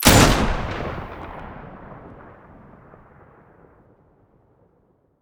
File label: muzzle pkm mono, weapons